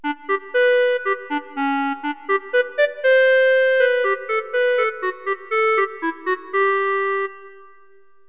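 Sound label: clarinet; Roland; An Invitation To The Roland World Of Music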